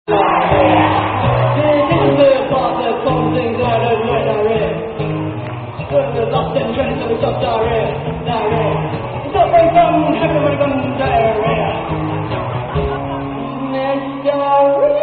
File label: Diarrhea Song